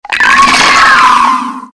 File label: skeleton bat; Skeletal Ravager